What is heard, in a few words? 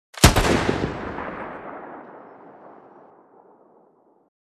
Sniper rifle single shot